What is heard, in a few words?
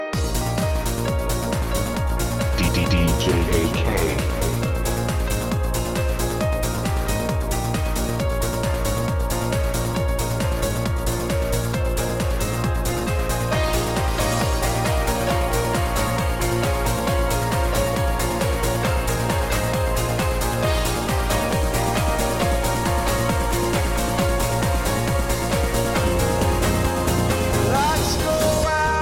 Clockz Techno Mix